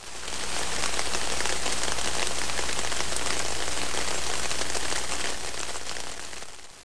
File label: SNOWFALL